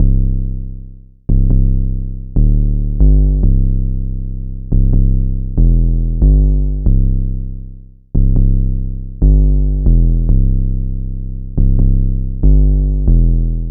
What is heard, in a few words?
808 loop 140 C; Slate Digital BASSHOLE Sample Pack